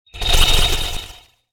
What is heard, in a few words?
Sci-fi Transition